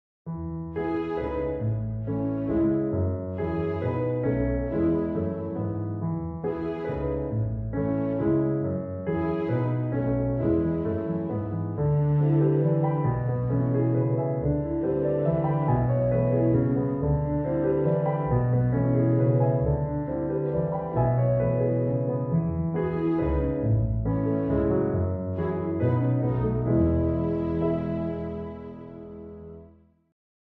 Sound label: With Strings; 30sec